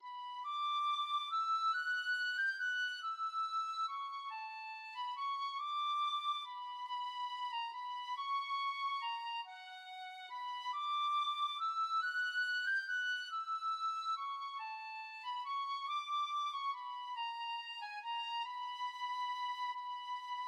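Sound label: penny whistle, tin whistle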